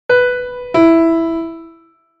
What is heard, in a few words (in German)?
Intervalle sukzessiv